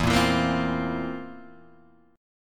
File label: C 11th E